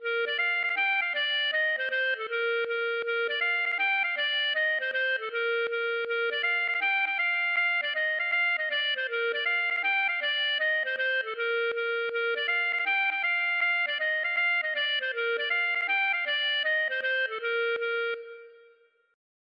gavotte; Gavotte pourlet No9

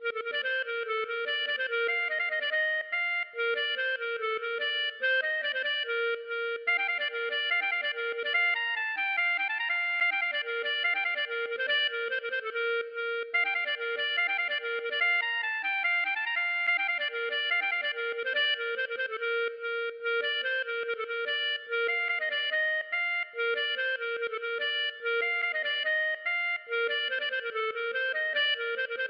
gavotte; Gavotte pourlet No1

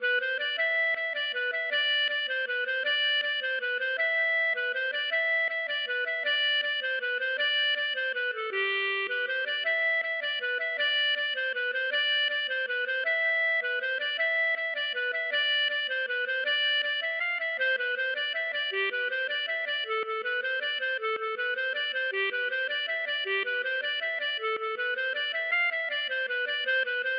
mazurka valse

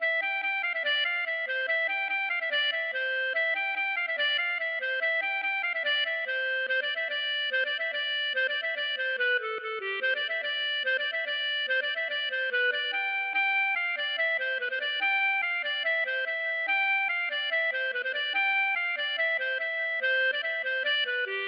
sautieres

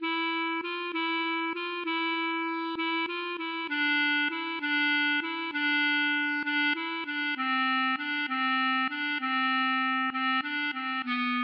valse

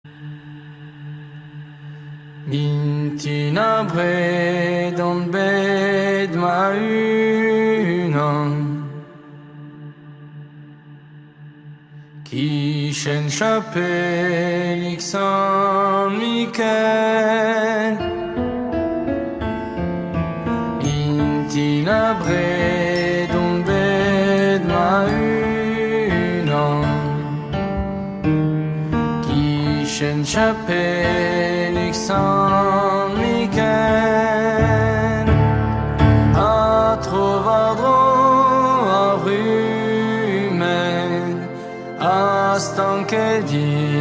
chant Breton; Chant breton : Tuchenn Mikael

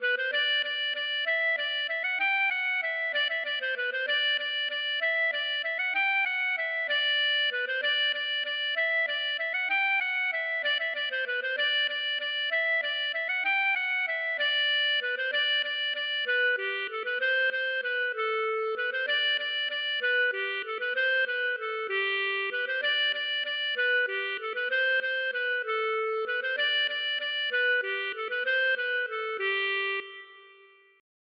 bourree; Confolens

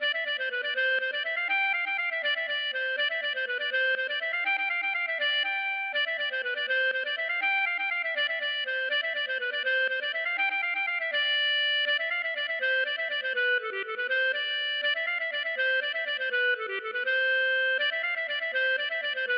bourree

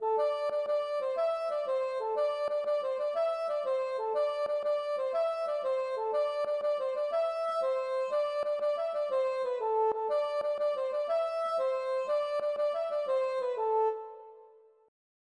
paludier bal

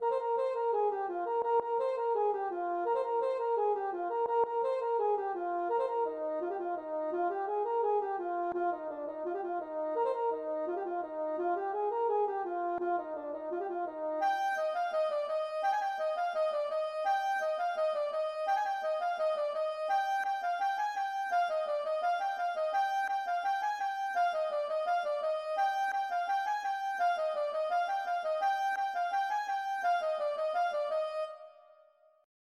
ridee

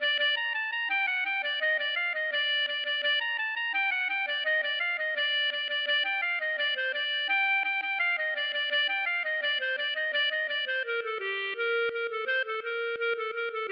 gavotte; Gavotte Scrignac

